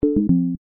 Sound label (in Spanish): incorrecto